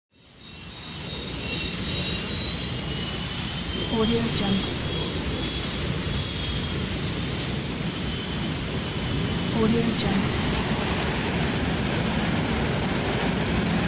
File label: jet plane take off; pure-noise